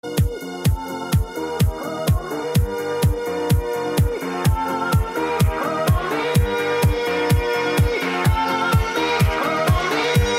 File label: trance ringtone; tomorrowland